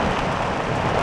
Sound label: Car Dirt